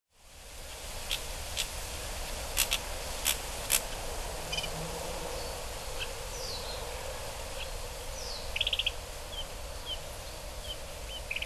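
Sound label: kibitaki, Narcissus Flycatcher; hyogo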